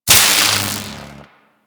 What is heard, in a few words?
Electricity Hit Effect; Warframe